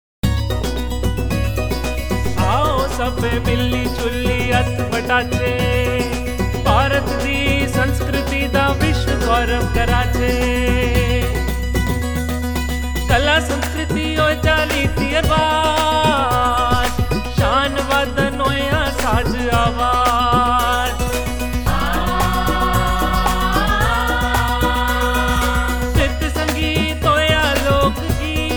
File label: Dogri Jingle